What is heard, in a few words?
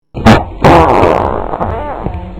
fart